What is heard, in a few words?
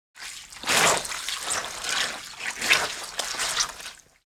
Paint roller dipping into the paint can